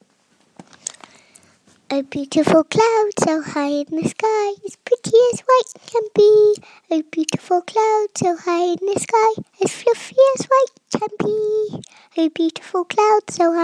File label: small girl sings